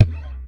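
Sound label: thumb, bass; Xsample VOL-1; SLAP+THUMB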